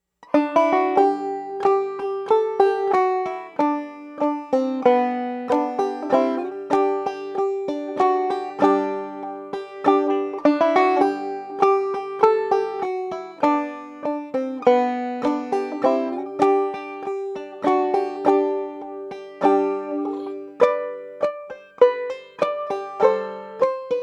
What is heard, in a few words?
ding high; Christmas